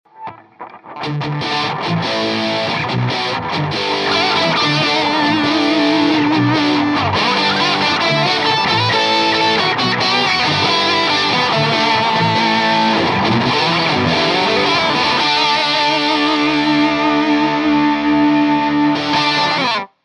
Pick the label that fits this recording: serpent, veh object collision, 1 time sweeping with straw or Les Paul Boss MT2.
Les Paul Boss MT2